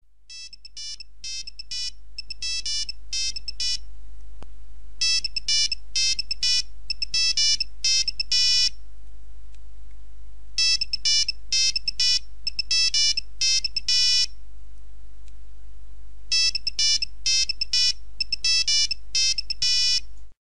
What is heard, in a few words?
nokia 3310 original ringtones